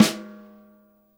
JAZZKIT SD, Drums Acoustic; AKAI; 90s sample CD; USB Soundscan vol.10; JAZZKIT 3

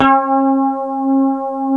D 4, Hypemonics; Guitars

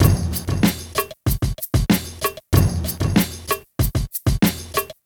Club Tip, R&B Drums; Sonic Foundry

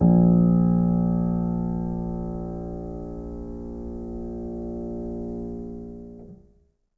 Acoustic Piano; InVision Interactive; Miroslav Vitous

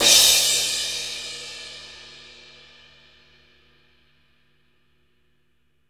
DUAL CRASH

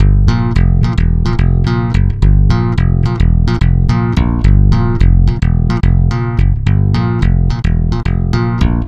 Stick Loop, Stick Bass; Sonic Foundry; Leo Cavallo Bass Taster

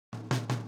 Boogaloo - Snare, 82 BPM; Drums; Intro; Sonic Foundry; Drums from the Big Room The Mixes

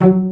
Pizz Celli F#2; Proteus 2000; Multisamples; Digital Sound Factory Proteus 2000

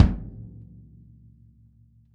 kick

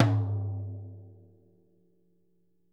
ROTOTOMS; Sonic Reality

